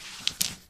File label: die Grab, casino